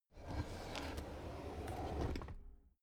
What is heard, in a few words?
drawer cabinet crammed open soft